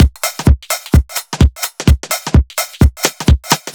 Beat, 128bpm, uk garage

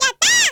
Levels Cleared; Nontan to Issyo Kuru Kuru Puzzle; Type A

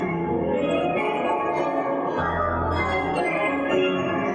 Tonal Re Draw, sparse soundscape

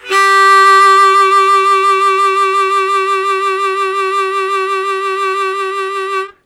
Strong Vib Sustain G1, Harmonica; Zero G

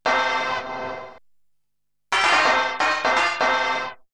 Brass; ZERO G - Datafile DISC 1

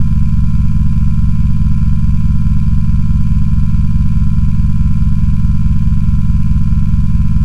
Drone Ambient; Drums & Drones

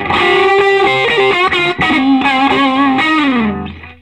SOLO, Vintage Blues Guitar; USB Soundscan vol.22; AKAI; SOLO B125

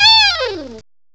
sax fall; REEDS2

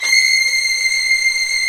STR, Vls fff slo; Roland L-CD702; Vlns 8 fff

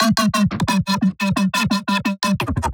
Lead; neuro; InsideInfo

